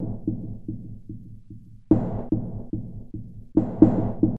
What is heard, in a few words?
Roadroller beat low